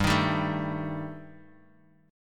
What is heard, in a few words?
G Suspended 2nd Sharp 5th, Guitar Open D; chordshape; down